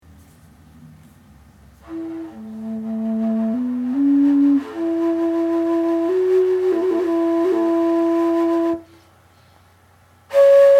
fujara

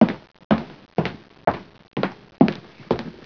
walking

